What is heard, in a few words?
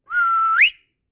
whistle